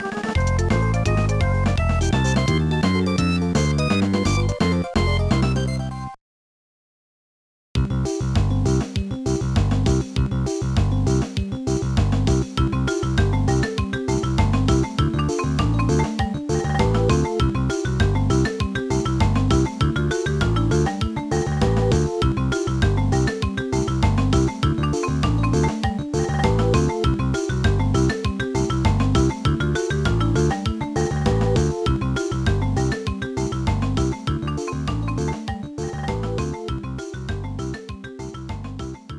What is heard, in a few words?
BGM; Jurassic Park Institute Tour: Dinosaur Rescue; GBA